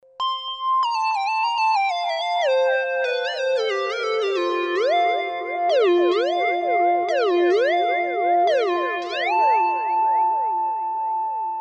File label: Mod Filter